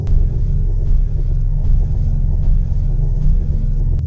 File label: god ray windup layer; apocalypse